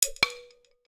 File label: Wood MMC removal; Nokia; audio themes